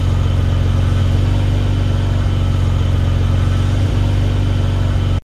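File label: vehicle idle loop car; The Sims 3; Windows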